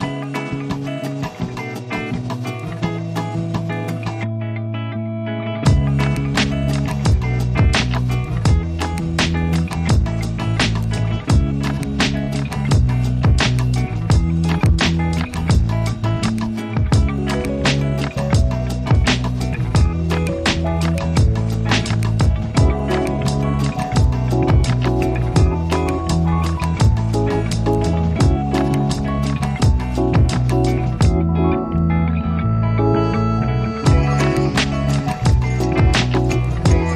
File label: Barrio Groove